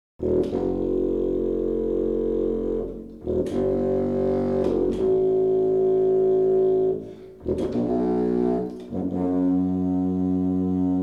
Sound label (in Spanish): Sonido de contrafagot; Instrumentos de viento; Viento-madera; fagotes